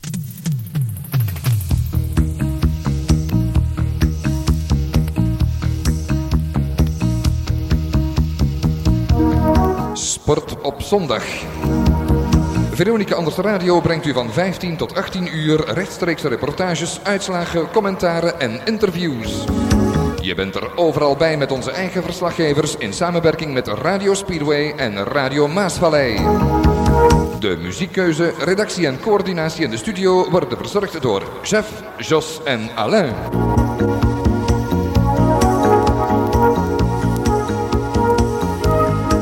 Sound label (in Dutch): Jingle Sport op Zondag; Sportuitzendingen